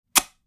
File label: Grandfather short kiss